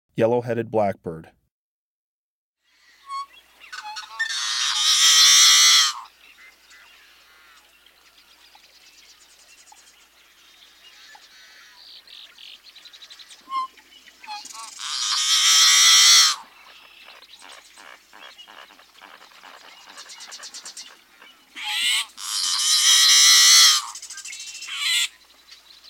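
Yellow-headed Blackbird, Breeding Birds of the Chicago Region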